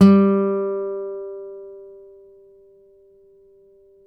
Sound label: NYL G, FLAMENCO NYL; Spectrasonics; Hans Zimmer Guitars VOL-2